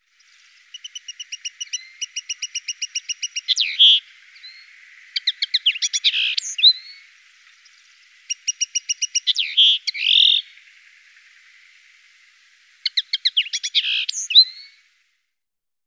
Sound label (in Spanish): pardillo comun